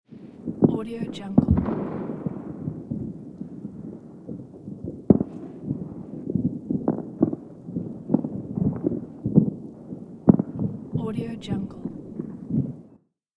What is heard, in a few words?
far firework; elevaudio